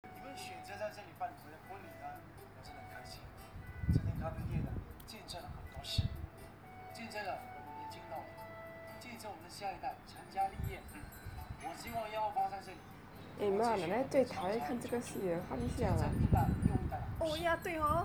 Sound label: window scene